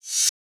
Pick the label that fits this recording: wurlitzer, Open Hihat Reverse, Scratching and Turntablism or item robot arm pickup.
Open Hihat Reverse